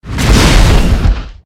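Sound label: Dragon attack bottom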